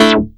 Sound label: short chor; Masterbits; Vintage Voltage; SEQ-FX